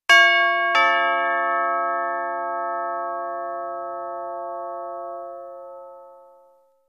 Low-pitched, Two-toned, Avon-style Doorbell.; chimes & bells

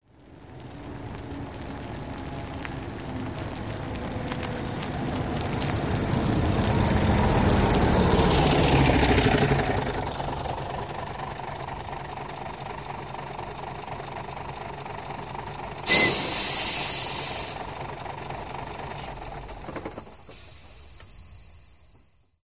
Bus Stop Off Coach Com

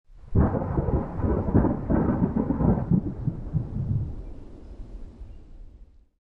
Alien Storm Thunder

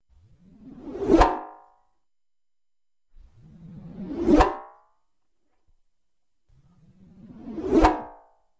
Twangy Whooshes 3x

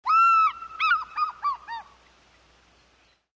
Fish Eagle Dist Botsw, eagles african

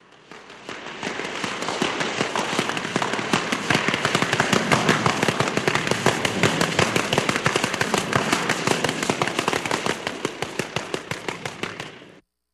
Broom Slaps On Ice, Curling

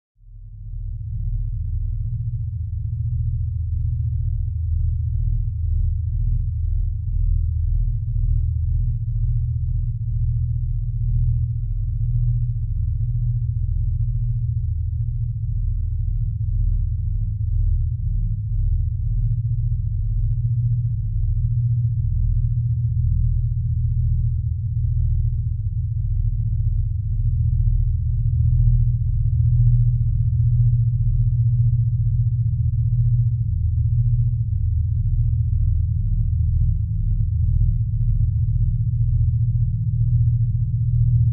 Bed Galactic Throbbi

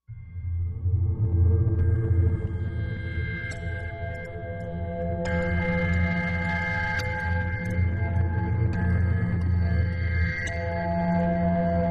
Count On It Warped Clock, eerie ambience

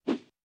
Small Swishes Light

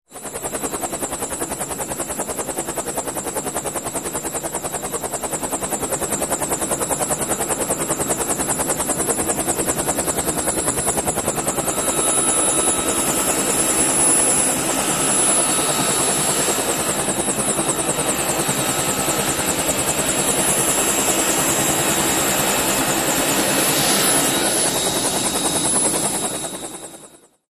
Helicopter Blade, blades

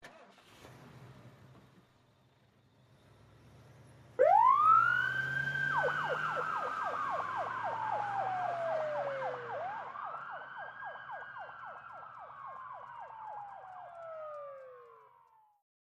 Ambulance Start

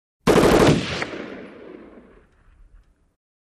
M-16 Automatic Machine Gun; Fire, Short.

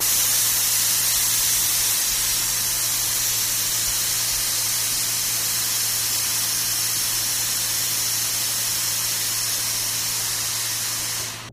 Jpl 70 Space Sim Air R, pneumatic

